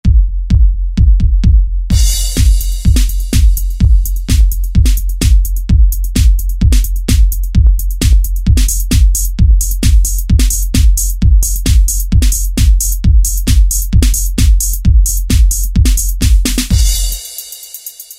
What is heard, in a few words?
Mega-Mix! Kit, Korg TR-Rack